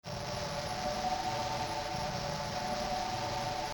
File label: noiz